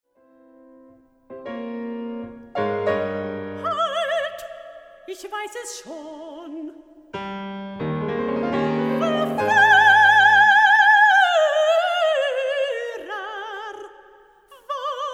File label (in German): Arie; Frau Fluth